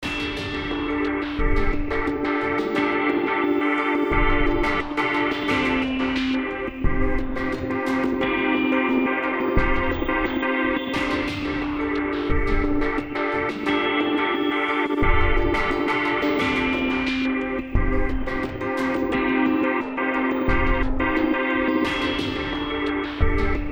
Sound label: sad 88